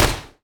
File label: explosion bullet; Epic Toon FX